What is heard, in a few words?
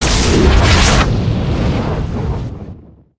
Katana Strike; weapons; aronwk collection; ndaudio